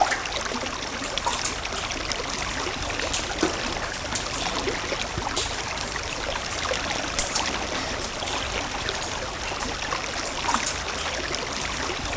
LAVA FLOW; Distored Reality

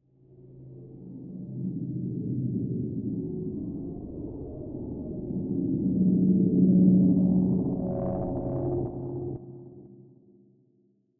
Dark Synth, cinematic drama soundbeds; musicradar

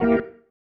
Stab One Shots, Organ

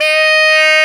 SAX TENOR

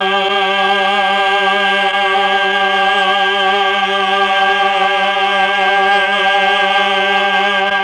CHOR; ILIO; Propeller Island Legendary M400

